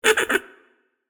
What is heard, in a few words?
Giggle Laugh